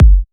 Bassdrum

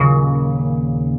Eb STAB, funky; Loopmasters; Funky Underground Vol 2

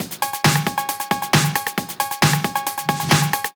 135 DRUMLOOP; Loopmasters; Booty Breaks Vol.2

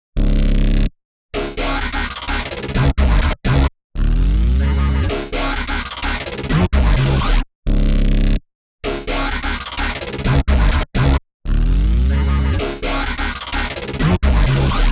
128 C# Full Mix, Complex Electro Synths; Motions; Prime Loops

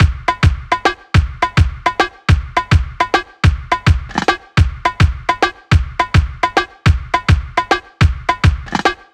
105 Ragga, Breaks, 105 BPM; loops; Loopmasters; Jon Carter & Alex Blanco; Block Party Essentials

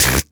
Human Beatbox, Beatbox Hits

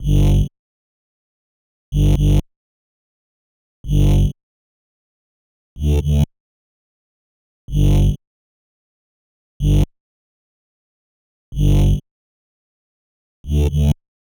125 G Bass, Complex Electro Synths

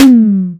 Toms

